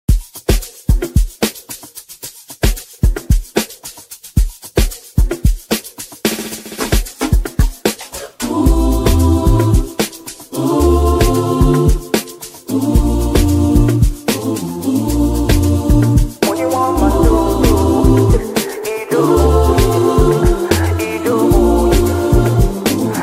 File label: Loud Urban Choir